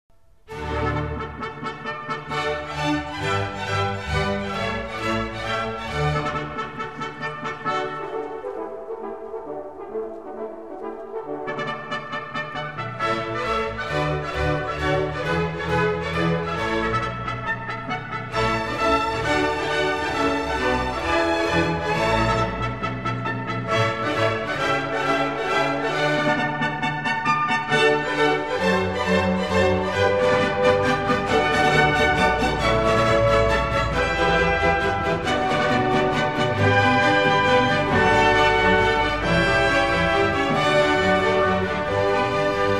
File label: Overture; Handel; Fireworks